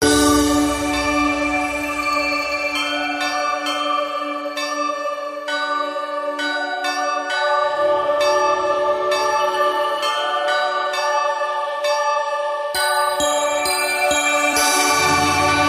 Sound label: Ambient Bells Mix